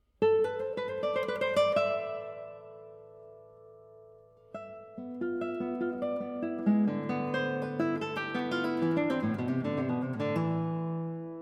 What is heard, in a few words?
Guitar Solo